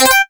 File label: sfx equip; Duck Game